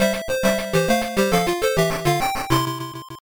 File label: Page Intro; Mezase Pro Yakyuu Pico gamerip 1995